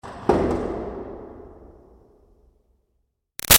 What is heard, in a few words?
Latch Metal Silo Boom Big Reverb; Latches; Objects